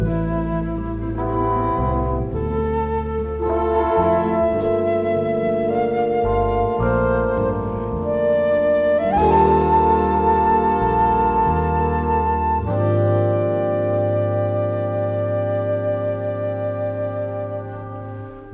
flute, Sad; Loops

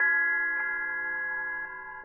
hower, Bells; Misc Percussion

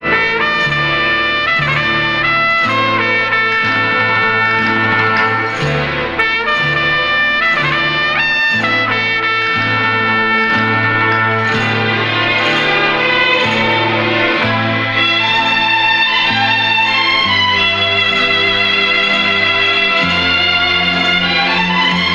horns strings, Sad; Loops

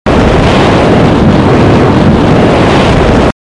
airplane, Vehicles

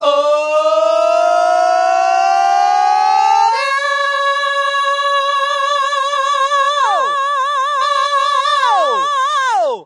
"oh yeah" SUNG

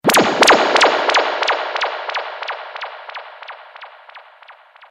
echoing zap; Zaps and Beeps

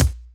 Kicks

